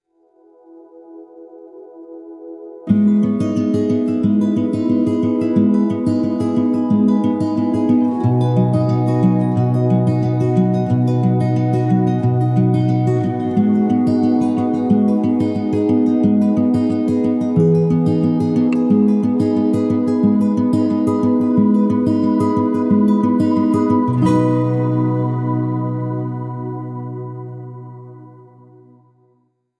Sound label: mellow folk instrumental